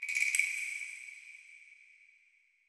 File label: Random Music Cuts Jungle